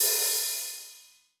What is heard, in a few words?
brush; Northstar - New Gold VOL-2; 30S BRUSH